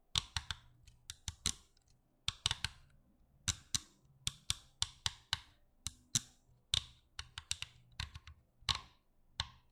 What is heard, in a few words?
Thumb Rotary